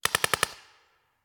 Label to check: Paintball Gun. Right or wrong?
Right